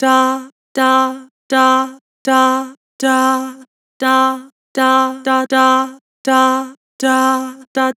Vibrato "Dah", Vocals; Harmor Vocals

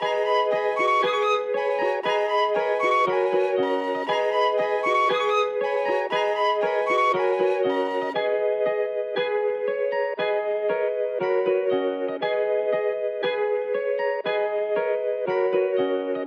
118 BPM G# Min, Melody, Trap; Cymatics; 2022 Melody Collection; Trap Pt 1

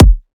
Riddim Kick

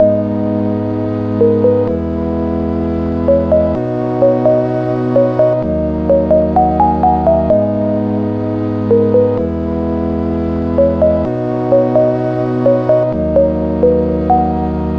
Melody Loop - 128 BPM G# Min, Synth Loops; Cymatics; Light Free Sample Pack; Bonus Samples

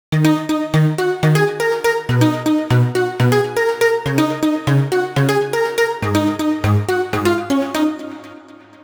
Synth D#m 122Bpm, House; Musical Loops; House Kit; Ghosthack; Advent Calendar

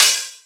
Open Hi Hat, Dubstep Cymbals; One-Shots; Drums; Ghosthack; Ghosthack - AC2024 - Day 13 - Dubstep Sounds 2025

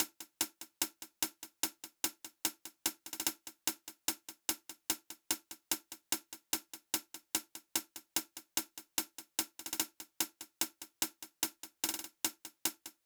Drum Loop - 147 BPM Hihat; Loop Stems; Cymatics; Vibes II Drum Loop Collection; Sparkles

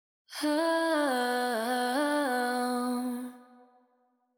Euphoria Ad Lib Wet - 100 BPM B Min; Cymatics; Vocal Sample Pack; Ad Libs

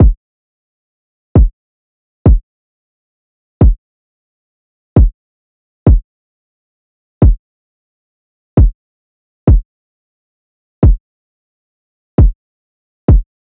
Drum Loop 133 BPM Kick; Drum Loops; Loop Stems; Cymatics; Mystery Pack Vol 4; Enemies Drum Loop

